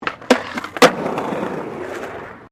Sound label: SKATEBOARD SKATE BOWL START